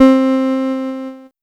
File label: Casio VL1 Piano; Solo-Synths; Giga Samples Collection